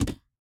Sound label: reel Bounce